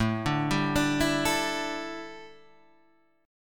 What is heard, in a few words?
A 7th Suspended 4th A, chord; A7sus4 arpeggiated